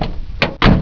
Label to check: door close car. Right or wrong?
Right